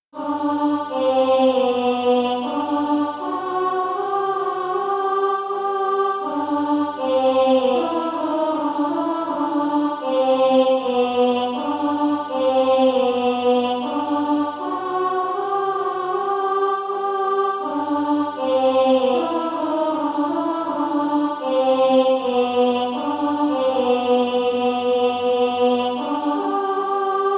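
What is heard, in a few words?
alto, Sheet music (SATB) including Practise Music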